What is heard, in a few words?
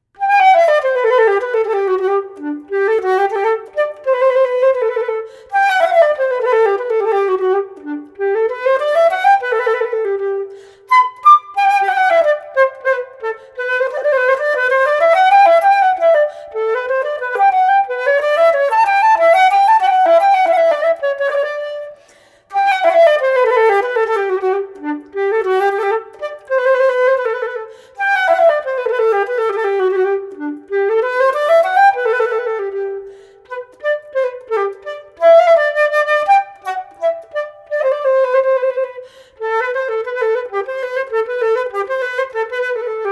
musette en rondeau